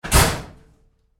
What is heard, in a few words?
Door Locker Impact Roomy Hard, Metal Door; Metal Doors (Sound Effects:Doors); Big Room Sound